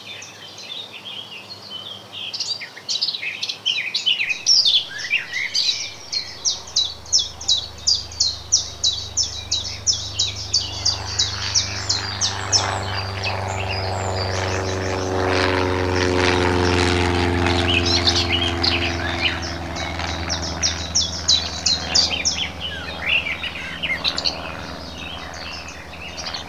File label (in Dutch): Bosgeluiden lichte vogels met vliegtuig